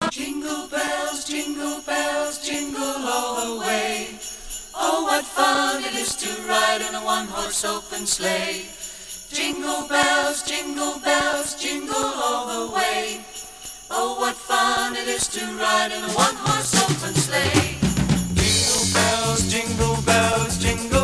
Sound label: jingle bells